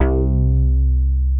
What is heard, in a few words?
BASS BANK, L; Sound Gallery - Charged